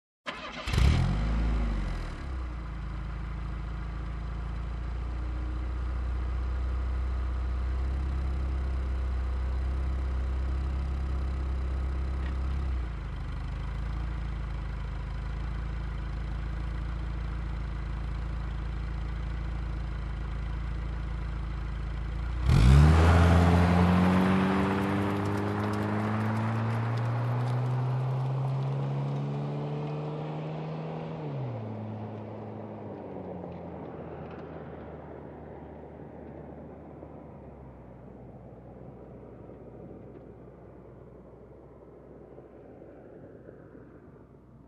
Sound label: Car engine ignition and drive away